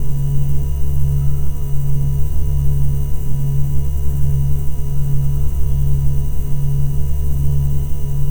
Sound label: Drone Ambient; Drums & Drones